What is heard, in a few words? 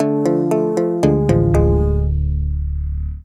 Tombstone Melody; Haven Forest; Jak II; PS2